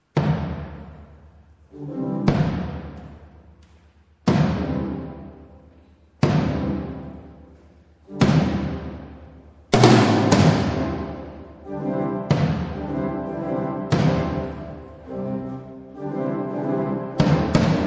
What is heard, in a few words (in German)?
Didgeridoo & Orgel; Encore